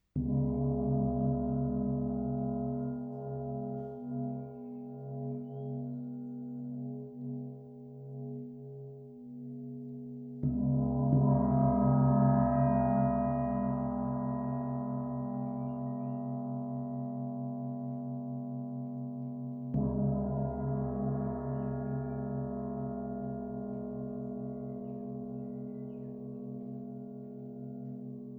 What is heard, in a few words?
Gong